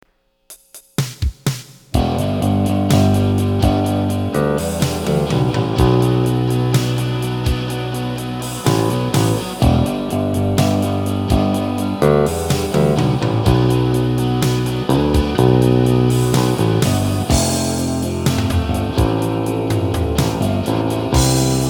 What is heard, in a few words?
ringtone; Apartment